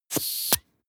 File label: vent air release short